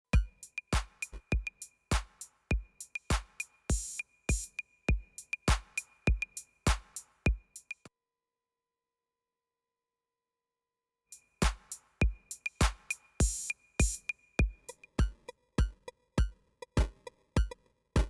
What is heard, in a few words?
Coarse Dry Wet; Bastl